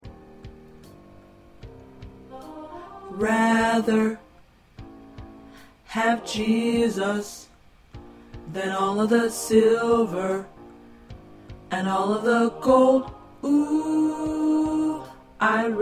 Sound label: SATB Tenor